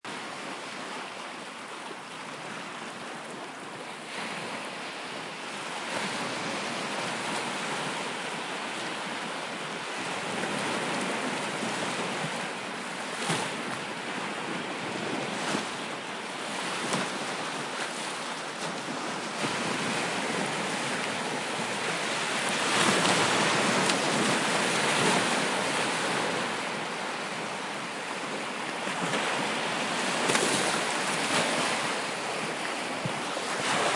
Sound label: ocean waves sea beach close stereo